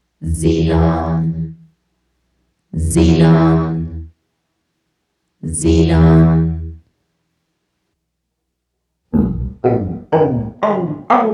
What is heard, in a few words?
Xenon (Vocal Effects)